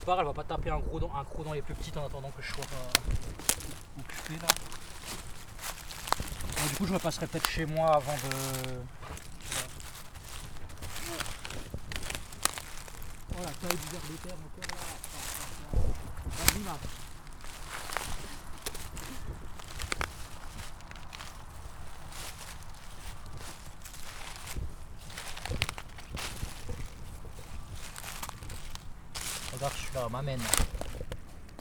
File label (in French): Chasse aux limaces, campus universitaire de la bouloie